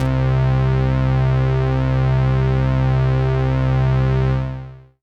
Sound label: Synth Prophet Bass C; Electronisounds